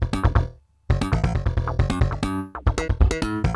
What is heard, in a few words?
Slap Bass, 134.64; 8A